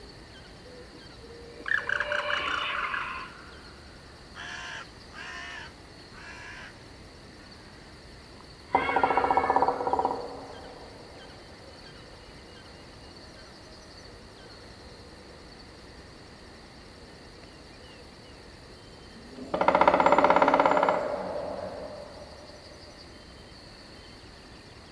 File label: dark wood ambient; 7 Mages